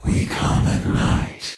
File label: Spirit Mausoleum Taunt; SFX Fallen Ones; Kingdom Rush Vengeance; tower defense